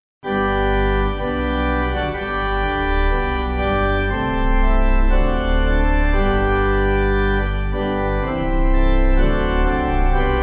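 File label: G Pipe, pipe organ; 5v; And Can It Be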